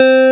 Synths Root C

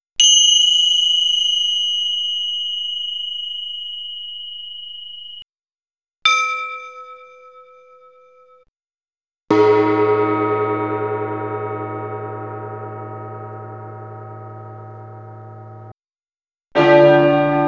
Ambient

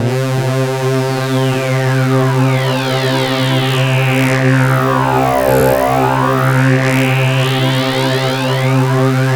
SYN K-2000, Roland

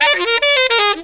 SAX

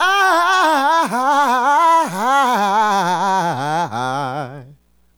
Adlib, Hip-Hop and RnB Vocals

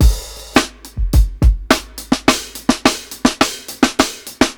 105 BREAK, DRUMS LOOPS; BASEMENT DRUMS VOL 2

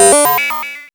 MOOG HAT; AMG - Vince Clarke Lucky Bastard

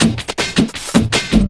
LOOP L, Drum & Bass 160 BPM

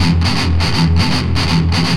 Riff, Industrial; Nasty

